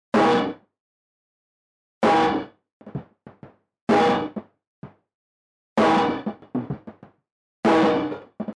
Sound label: snaredrive